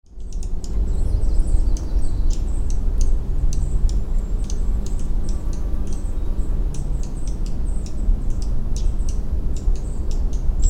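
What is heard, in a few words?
Dark-eyed Juncos, Great Wass, Maine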